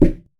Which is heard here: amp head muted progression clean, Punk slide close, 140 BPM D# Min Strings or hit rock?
hit rock